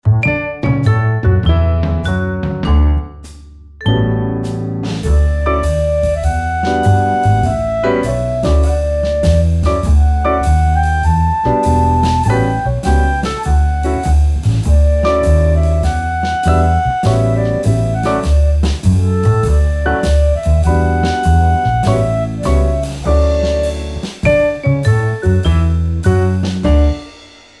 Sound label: The Itsy Bitsy Spider Instrumental